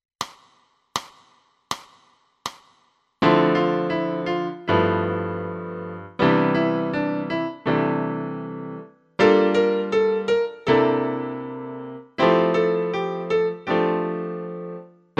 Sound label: piano